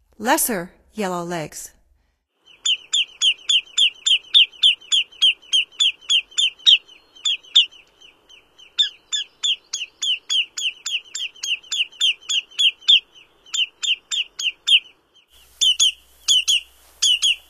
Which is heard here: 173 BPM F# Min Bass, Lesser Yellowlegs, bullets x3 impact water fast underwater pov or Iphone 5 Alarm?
Lesser Yellowlegs